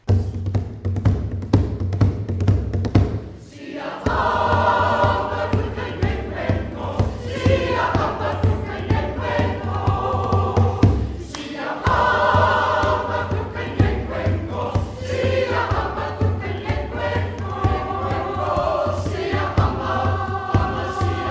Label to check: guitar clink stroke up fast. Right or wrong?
Wrong